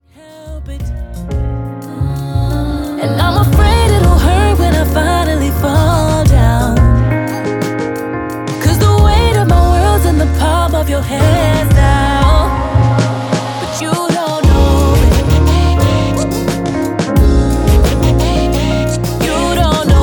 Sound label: Alternative R&B Vocal Female Rap